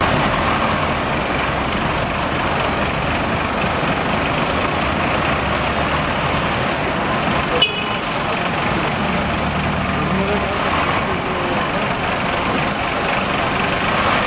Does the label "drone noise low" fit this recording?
No